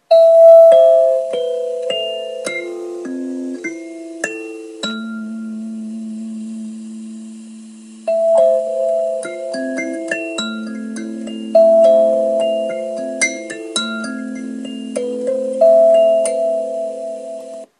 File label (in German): Sansula Deluxe A Moll Pent